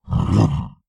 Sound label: kagouti attack; skywind